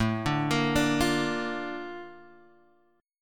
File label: Bb Major A, chord; Bb/A arpeggiated; JGuitar